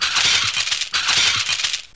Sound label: Game end; Bible Fun Pack; Education Publishing Concepts; NOAH